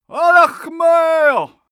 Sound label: male shout arabian